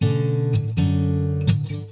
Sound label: 122, B, Rhythm Guitar; Magi